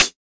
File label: dry hat sample c key, Royality free hi hat tuned to the C note. Loudest frequency: 6993Hz; hat samples